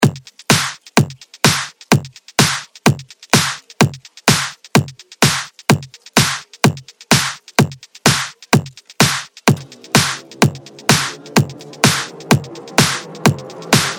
bassline rumble

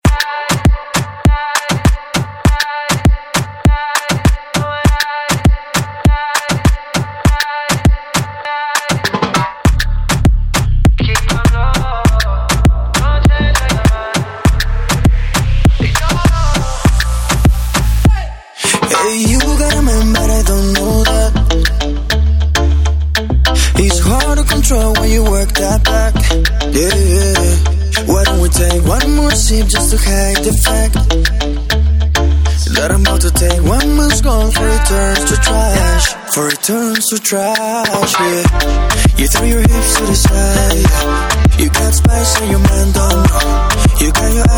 Drums Remix

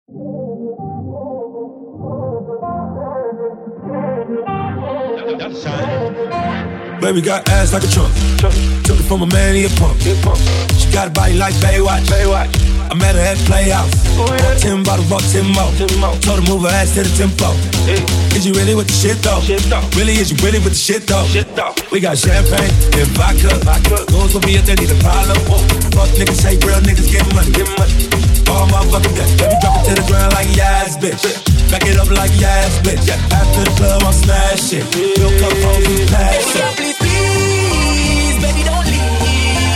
Remix

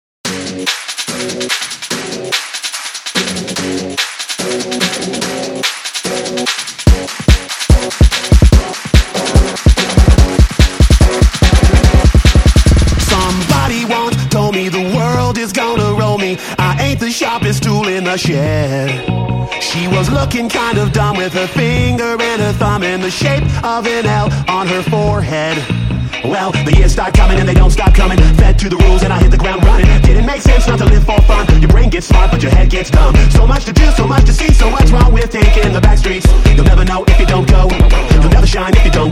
808 gong

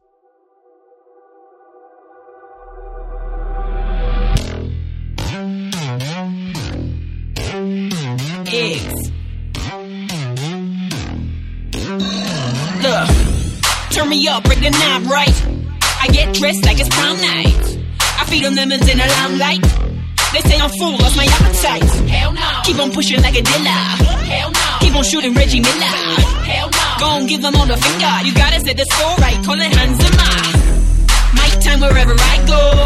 Remix

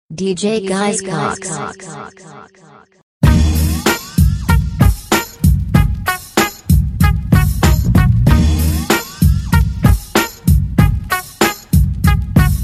95.3 126 BPM transition

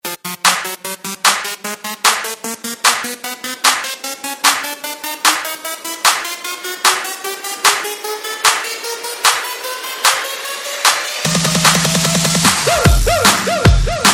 150 160 BPM transition mashup

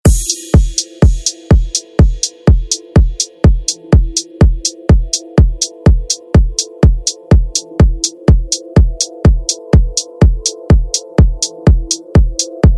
Remix

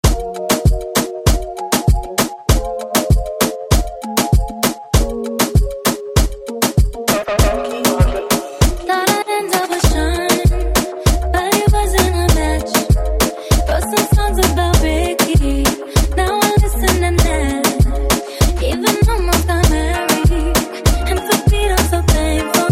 Phonk Moombah

